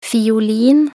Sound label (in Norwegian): fiolin